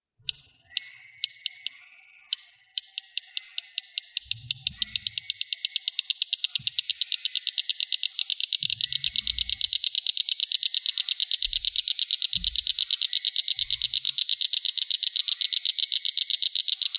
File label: Northern Cricket Frog; Wildlife